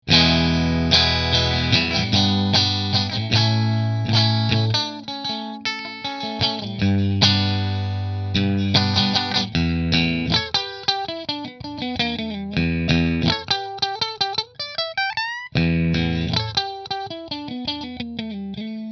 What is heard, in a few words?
Position 3 Fender; Showmaster Position 4; Fender Custom Shop Showmaster